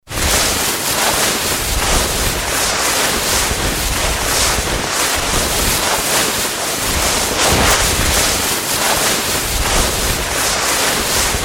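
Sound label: downhill run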